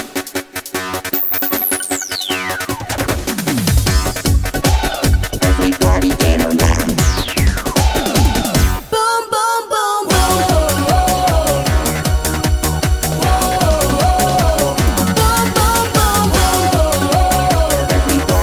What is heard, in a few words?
Eurobeat Mix